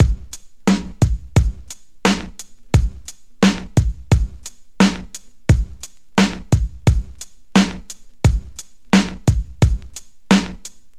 87 bpm 90s rap drum beat B key, Free breakbeat - kick tuned to the B note.; drum loops